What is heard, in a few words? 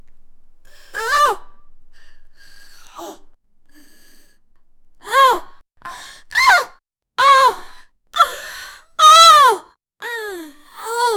a woman grunt hard, vocalizations